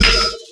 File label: land film trick; Tony Hawk's Project 8; XBOX